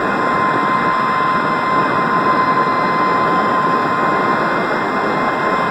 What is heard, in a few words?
turbine high; engines tanks; War Thunder